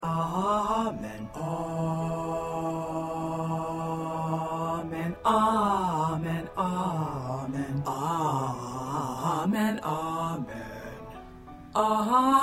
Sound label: Bass